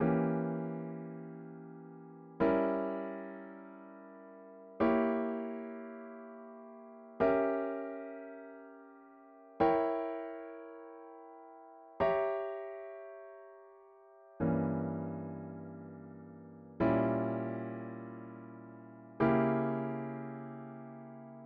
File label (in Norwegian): dim7 akkorder